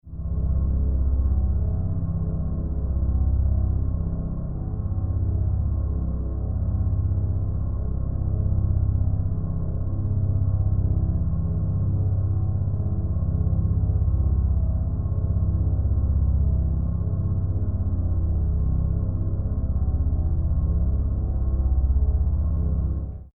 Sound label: Drone Horror Tonal Haunted Atmosphere Ominous Suspense Eerie; Drones; Sound Design; Room Of Suspense